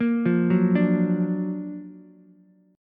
F sharp Augmented Major 7th F sharp Ukulele; arpeggiated; JGuitar

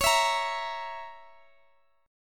Db Minor 6th Db down Guitar Half Step Down; strummed; Dbm6

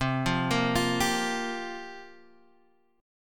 C 7th Suspended 4th, Guitar, Half Step Down

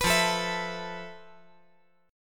F Major Sharp 11th, chord; 16,17,17,19; down; 5G,4C,5E,5A; chord shape

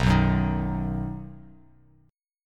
B Major, Bass Standard; strummed; 7,2,4,8; JGuitar

